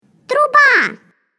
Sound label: trumpet; Russian Pre Assessment Level 1 – Russian & English Course